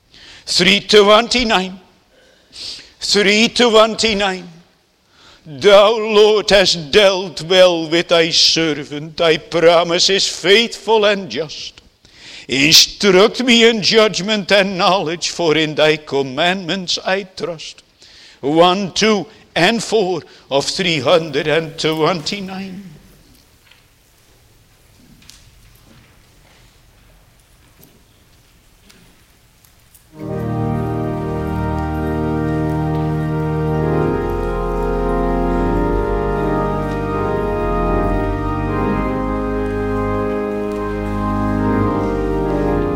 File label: Singing; The Lord's Incomprehensible Goodness